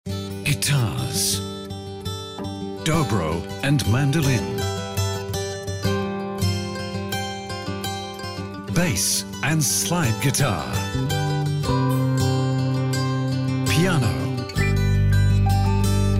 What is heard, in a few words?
guitar